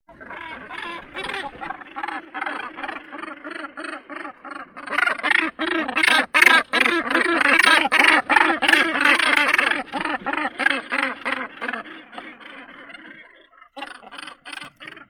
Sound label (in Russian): olusha severnaya morus bassanus